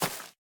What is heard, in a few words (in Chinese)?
藤蔓破坏声